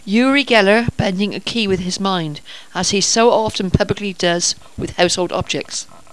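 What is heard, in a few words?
key bender; Paranormal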